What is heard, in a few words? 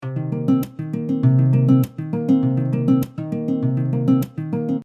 Percussive Cmin 100bpm, Soft Nylon Guitar; Percussive Patterns; MG Soft Nylon Guitar - MIDI Pack